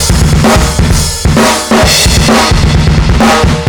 130 full, metal drum samples drums acoustic 130bpm; musicradar-metal-drum-samples